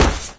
flesh impact hard, physics; Source Engine; video game

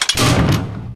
lever, office; ambient; Source Engine; cstrike

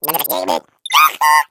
eggbot big wave

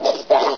con idle; source engine; hl1; video games

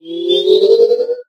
robot synth; Source Engine; Portal 2; robot parts

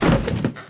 luxury lounge chair land; video games; player; source engine; tf

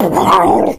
shoved, infected, rage

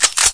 p90 bolt pull, weapons; Source Engine; cstrike

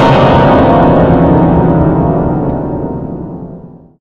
piano

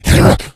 snarl, infected; npc; Left 4 Dead 2; Source Engine

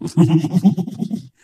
ballbot devious laugh

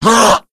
hulk pain; Left 4 Dead; Source Engine; tank

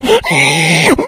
become enraged, infected; npc; alert; Left 4 Dead 2; Source Engine

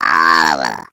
tractor beam; Portal 2; Source Engine; video games; eggbot

